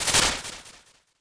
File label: fadeout